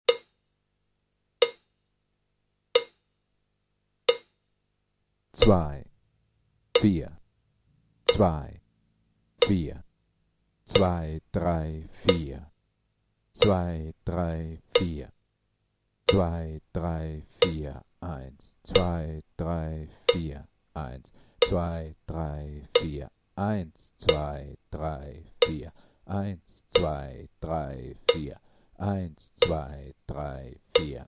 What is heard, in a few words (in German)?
Einzaehlen 2-4 Metronom